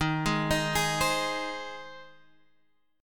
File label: C Minor Sharp 5th, D sharp, chord